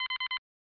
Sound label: time crit; specops